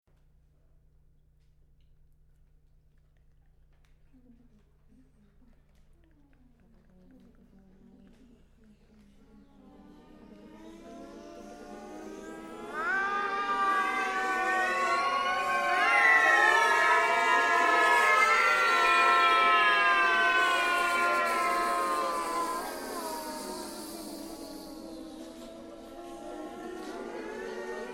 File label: jet normal